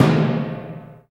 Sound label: HIT, Orch Kit; Roland LCDP03 Orchestral Perc; Orch Combo 1